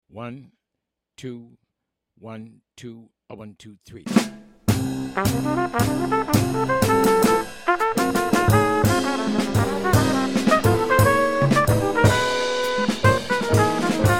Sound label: minus bass; count off sets up the intro; play figures and walk for the intro and melody; walk for the trumpet solo 2 choruses